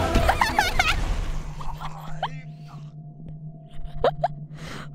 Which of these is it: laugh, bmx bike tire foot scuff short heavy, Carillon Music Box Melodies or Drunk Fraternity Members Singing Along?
laugh